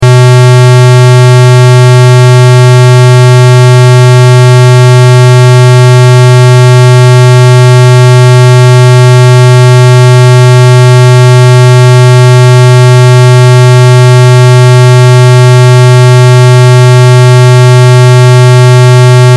Test Tone Square; Wave 125Hz 60 Secs 0Db Loop; Blastwave

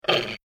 Jungle Animal Monkey Long Tailed Macaque Growl; Nature; Monkey Sound Effects

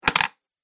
Gold Coin, money; Money Sound Effects

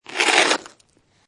Snow And Ice Hard Snow Or Ice Grate; Weather; Lynne Music

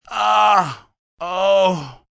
Moan Aah Oh, Moan, groan, male; Groaning Sound Effects

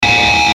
Noise Hum Overdrive Feedback; Strange Tone Sound Effects

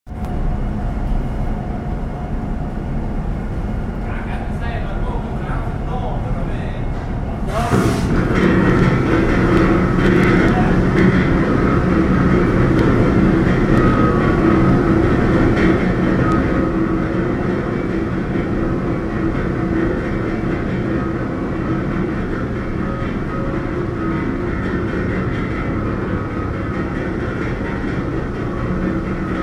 Ship Large Ferry Lower Deck Atmosphere; Tom Hutchings

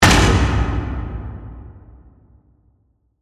Hit Slam Hard; Production Element, Imaging Element, Accent, Transition

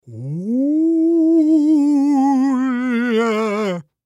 Human Ghost Moan, Ghost Moan Long Male Fifty Yrs; Male Fifty Year Old; Blastwave

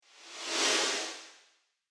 Brush Whoosh Faint; Whoosh Sound Effects; sound design

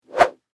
Whip Whoosh Coax, Cable; Foley; Sound Effects:Objects; BlastWave

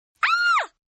Scream Female Short; Screaming; Voice; Partners In Rhyme

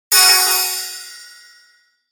Chimes Multiple Reverb Delay; Production Element Imaging Element Accent Transition; 5.1 STEREO MIX; Blastwave

